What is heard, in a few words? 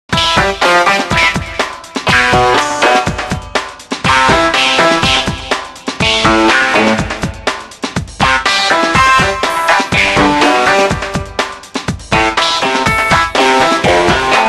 Ring Acid; Microsoft; Windows Mobile ringtones; Matthew Bennett